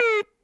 Drop Hi Speaker, Stylophone Beatbox

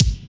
SLAMMER K, TECHNO; Drums In Your FACE!

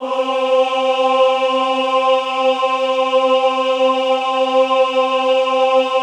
AHH C3, Classical Choir; Best Service; Extended Classical Choir; AHH MIX

